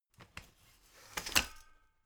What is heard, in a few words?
folding chair unfold medium